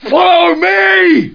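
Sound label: sword; Lords2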